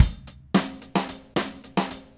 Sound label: Red Maple Groove 110, DW Red Maple Kit